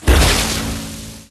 gem attack; malicia

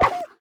bane wolf death; sfx

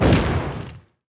ranged attack cast; sfx; enemies; deathwood

